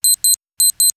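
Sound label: Signal, electronic fx; X-Static Goldmine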